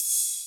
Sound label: EDM Future Ride, Cymbals; Big EDM; Future House Tools